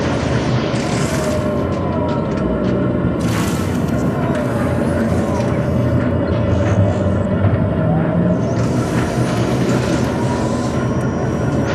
Robot Arm Track Fast Mid; Boomtown; Titanfall 2

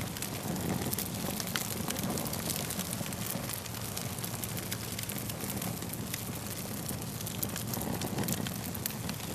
fire loop small crackle; colony; Titanfall 2

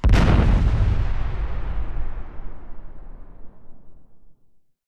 weapon fire explo; Titanfall 2

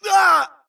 grunt death quick; Titanfall 2

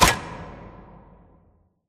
Giant Pillar Pods Stop; Titanfall 2; Boomtown

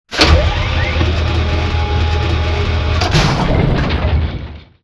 conveyor close; corporate; spectre conveyor; Titanfall 2

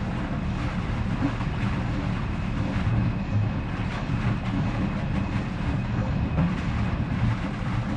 swampland pipe; Titanfall 2

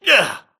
grunt hits; Titanfall 2

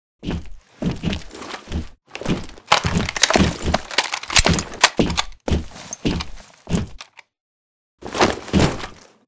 weapon toss left; Titanfall 2

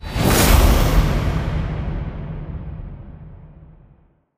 Match End Win Loss UI Sweep; HUD MP; Titanfall 2

